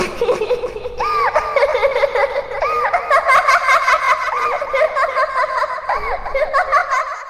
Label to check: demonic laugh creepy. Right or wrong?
Right